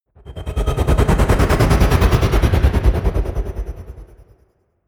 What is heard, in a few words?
whoosh flutter